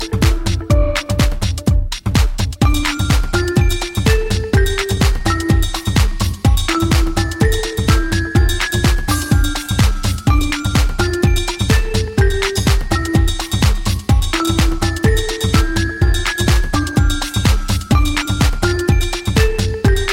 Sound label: Cute Song